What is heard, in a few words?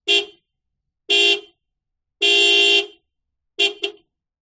transport car horn beeps multiple external toyota corolla